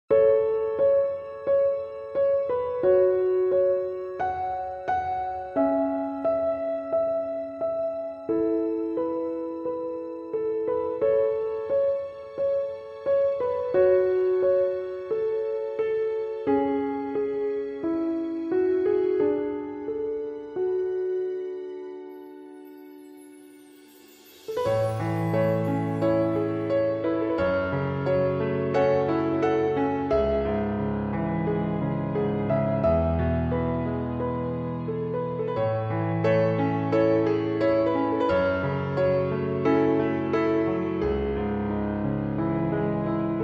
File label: Emotional Piano